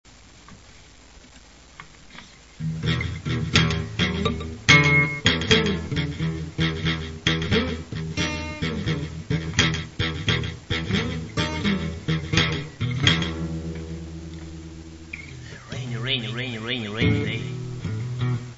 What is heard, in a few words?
rainy blues